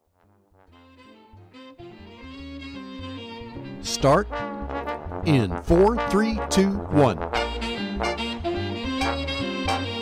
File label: drums cut